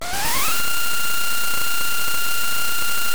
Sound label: inhale; kirbys dream land